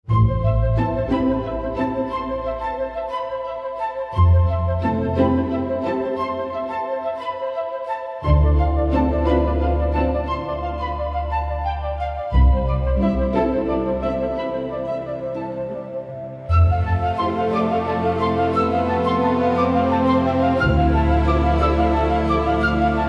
woodwinds

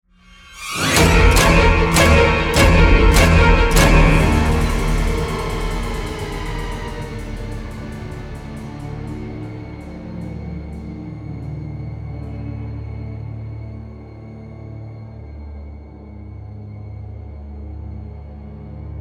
Teeth shock

